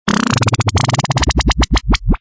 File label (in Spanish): teletransportacion